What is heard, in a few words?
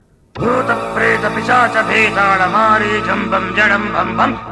Telugu Sms